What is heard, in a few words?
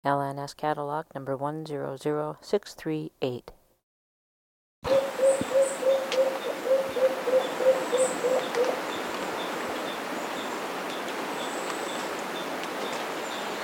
black billed cuckoo dove